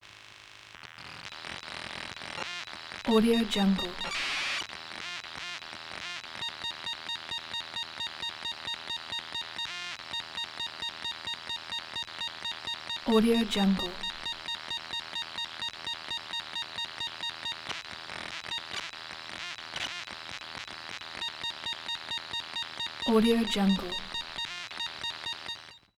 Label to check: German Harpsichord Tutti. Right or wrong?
Wrong